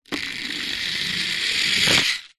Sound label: leisure rc car roll wheels mechanical whirr medium